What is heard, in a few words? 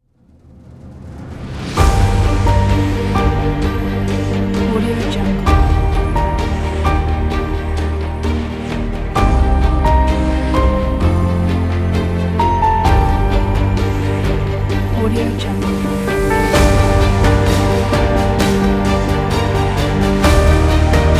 cinematic opener